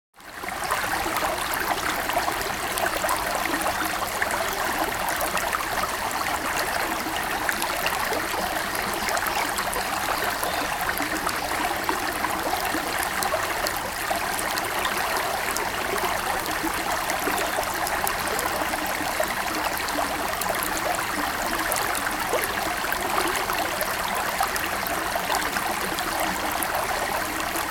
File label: stream